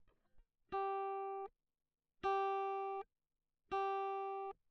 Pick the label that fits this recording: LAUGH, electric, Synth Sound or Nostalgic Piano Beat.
electric